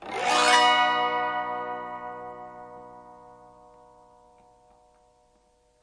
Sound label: autoharp B, Autoharp Chords; Multisampled Autoharp Chords; WORLD